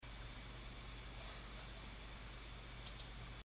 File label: car